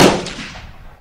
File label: Gunshot